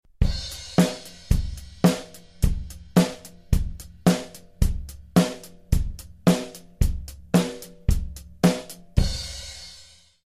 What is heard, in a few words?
beat